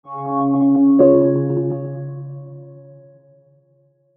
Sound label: Logo Filter Chords Delay, Logo Soft Synth Melody Chord Ascend; Sonic Logo; Blastwave; Production Element Imaging Element Accent Transition